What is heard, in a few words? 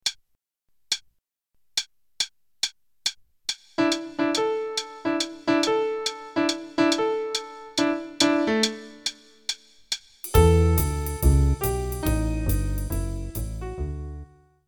Play a long, intro only qn=140, Jazz Piano